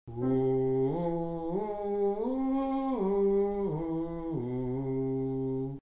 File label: legato